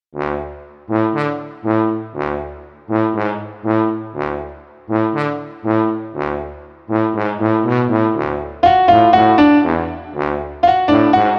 Bright BG

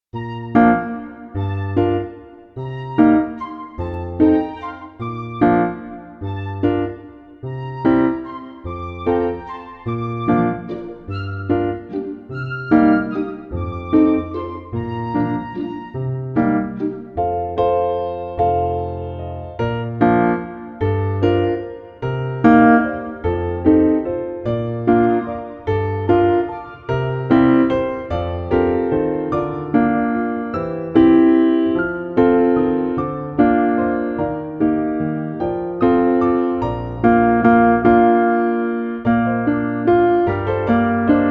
Grasshopper; 감성 BG

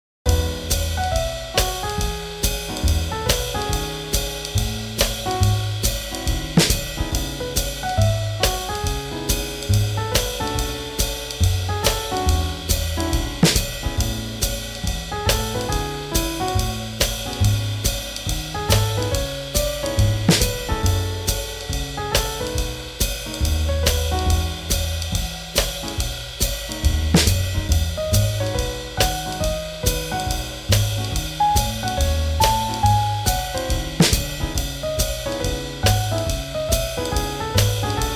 Swing Jazz

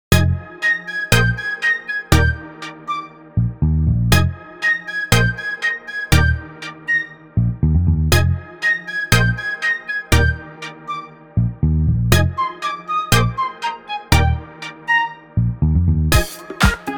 Bark, EDM Pop

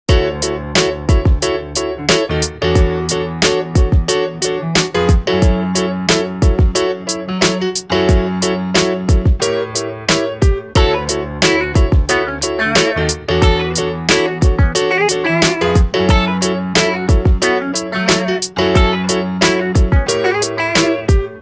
Bright Jazz

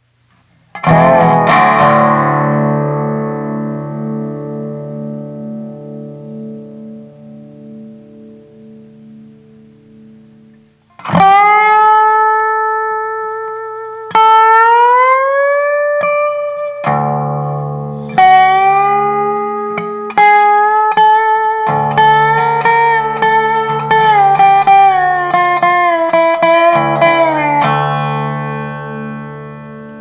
Dobro FX

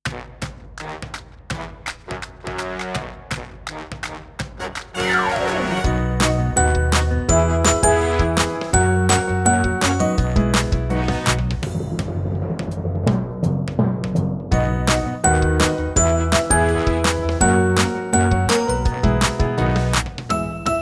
Beetle Dance, Bright BG

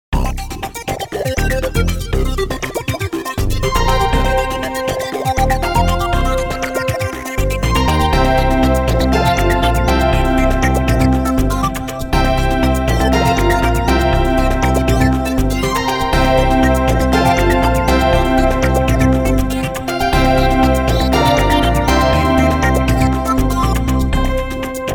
Electronic, EDM

